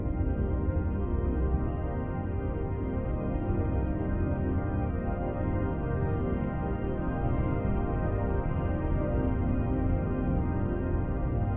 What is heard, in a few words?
card pad